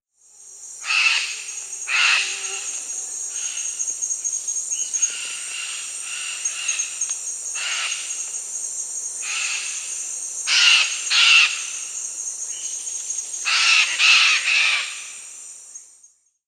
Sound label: Chestnut-fronted Macaw (Ara severa), Peru; S. America